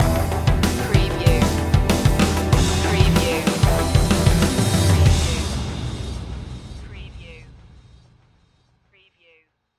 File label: stinger